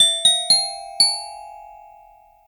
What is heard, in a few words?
Gliding Raven